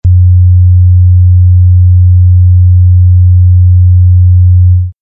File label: 90hz; testsignals